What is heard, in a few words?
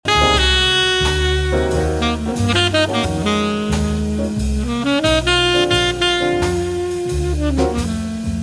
Quintet